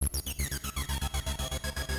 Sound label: Screech